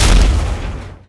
damage hits explosions, Warships; World Of Warships

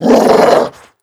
dog growl

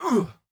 flinch light; Rust

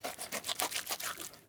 cut meat; Rust

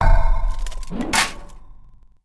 Build Pikeman; Civ4 warlords

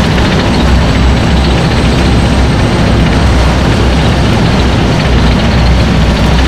tank move; War Thunder

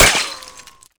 plaster small

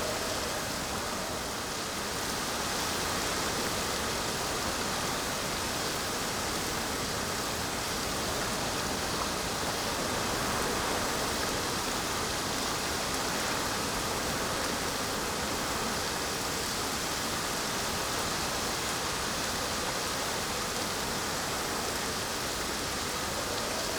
ambience coniferous forest night windy rainy; Rust

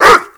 animal dog bark

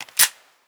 m92 slide shut; Rust

